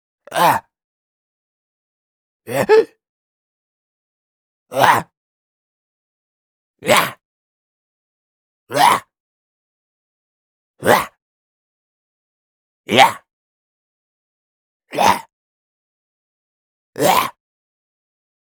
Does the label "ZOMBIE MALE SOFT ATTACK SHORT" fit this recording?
Yes